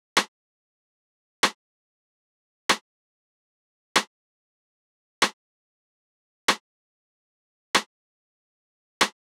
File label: Drum Loop 95 BPM Rim, Afrobeats; Loop Stems; Cymatics; GHOST (Beta Pack); Cruise Drum Loop